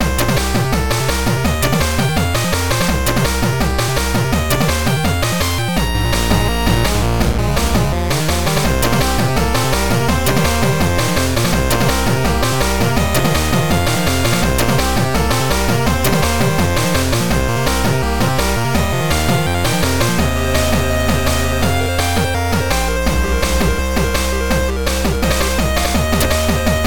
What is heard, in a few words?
menu, music; roflnauts